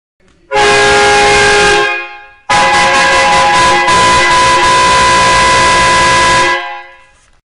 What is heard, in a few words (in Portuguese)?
Buzina 4 cornetas cromada 12v som de caminhão